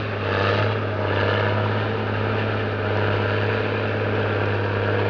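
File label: bulldozer; Image Bonanza; Expert Software; ondajob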